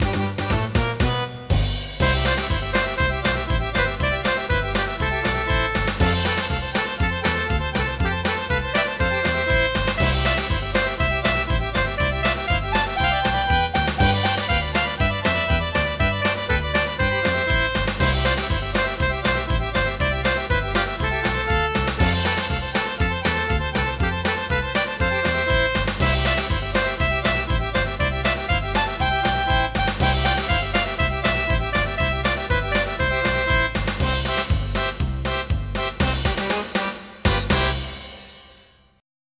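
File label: polka, soft piano; Magicom Multimedia Collection: Armageddon; darnit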